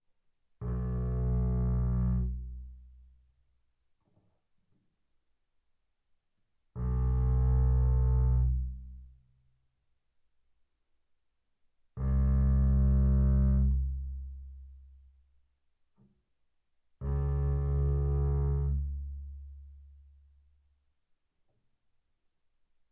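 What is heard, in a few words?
Bass arco sul E fortissimo B1 Ab2, double bass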